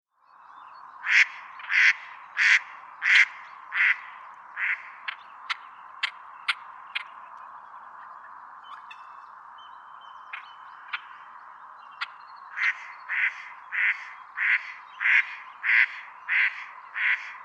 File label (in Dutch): wilde eend roep wijfje; krzyzowka; peter boesman